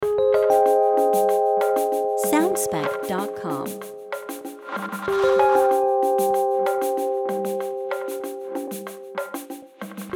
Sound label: Latino Puzzle More Mystical